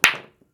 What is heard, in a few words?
hit, billiards; monkey2; PoolMod